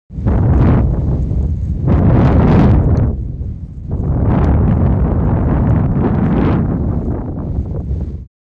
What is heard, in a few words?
Fireball Continuous; fireballs